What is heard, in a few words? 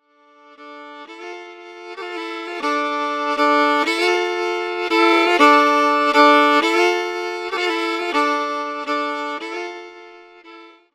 test, spatialization, stereo, emerge, movement, fiddle, through; Eiravaein Sound